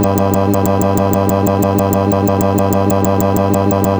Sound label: CD Pause Loop